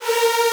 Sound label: synth lo